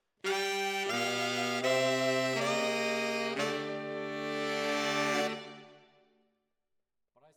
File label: ORTF SCHOEPS, piano